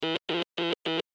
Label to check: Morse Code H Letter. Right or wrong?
Right